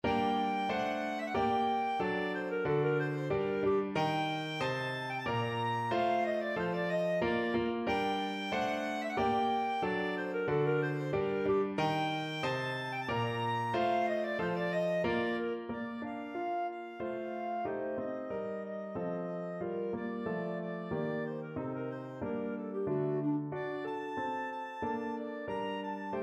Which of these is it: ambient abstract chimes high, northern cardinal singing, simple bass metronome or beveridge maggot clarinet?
beveridge maggot clarinet